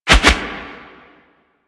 Melee Swipe; Warframe; Necramech

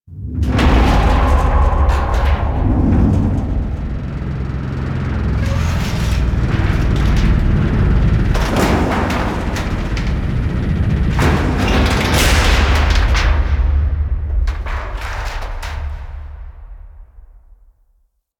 Rumble Break Catwalk Junction D; Warframe; Corpus Gas City; Ropalolyst

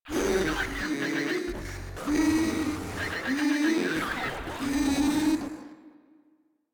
Proto Infested Runner Idle; Warframe

